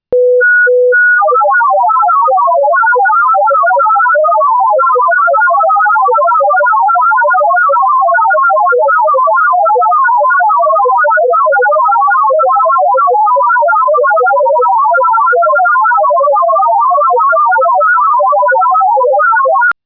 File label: OLIVIA, Hobby Radio